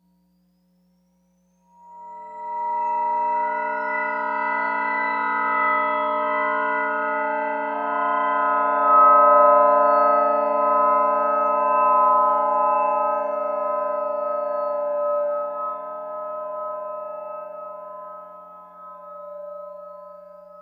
DISSONANT RING MODULATION; Sonic Foundry (Sony Creative Software); Vir Unis Infusion

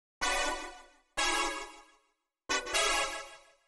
125 Soft pad E, Keys, Nu Jazz House; PowerFX - Nu Jazz House

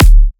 drum kick grownups; Virtual Riot; Drum Hits

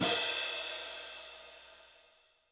HiHat & Crash, Roland TD-20; HiHats

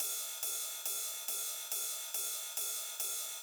drum Loop 140BPM Ride; Vengeance - Essential Clubsounds Vol.5; VEC5 Drumloops; VEC5 Multi Loops 140BPM

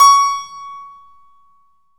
KEY HRPCR, Roland; Roland - LA Composer vol. 1

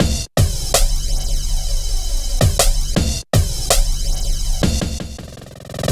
Drum Loops, Jungle, 162

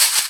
Cabasa, R&B Drums; Percussion

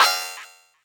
Percs, Elektron MachineDrum; SPS1 MKII

